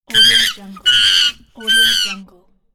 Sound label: monkey voice